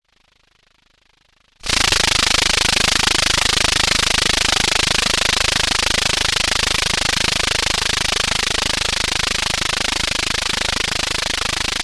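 Noise Ring VCO, Minisonic2 Analogue Sound Synthesizer; G.D.Shaw's Minisonic 2 synthesizer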